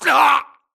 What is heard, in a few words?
grunt death neck; Titanfall 2